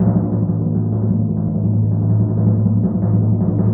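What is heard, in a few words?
timpani A#, Synclavier Percussion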